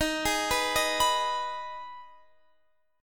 E flat Suspended 4th Sharp 5th Guitar Standard, chord; Ebsus4#5 arpeggiated; JGuitar